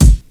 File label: bright kick drum g sharp key